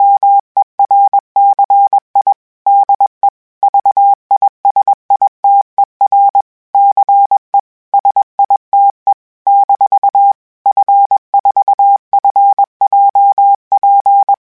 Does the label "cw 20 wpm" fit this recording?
Yes